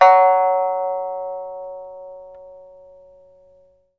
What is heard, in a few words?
PIPA F#4; Spectrasonics; Heart of Asia